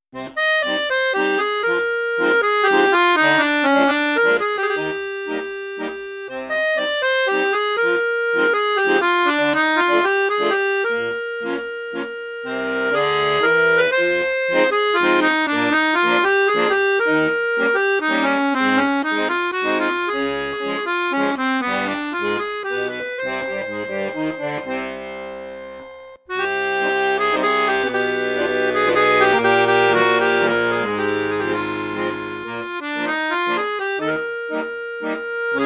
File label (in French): registre basson; mengascini f5